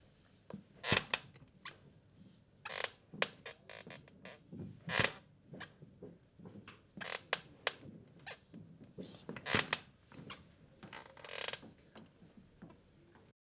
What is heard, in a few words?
Creek